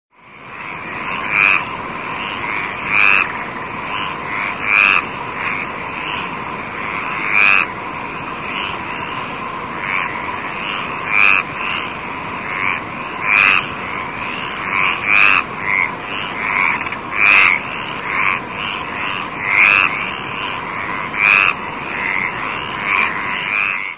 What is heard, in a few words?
Hyla meridionalis